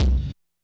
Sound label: kick, Hardcore Breakbeat; Big Fish Audio; Hardcore Breakbeat Action; Warned